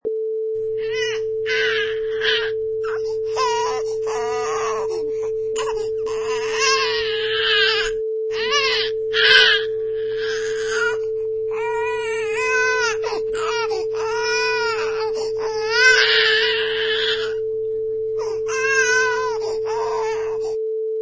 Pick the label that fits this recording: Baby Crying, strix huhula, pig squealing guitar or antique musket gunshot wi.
Baby Crying